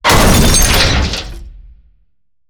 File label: crash; SoundsCrate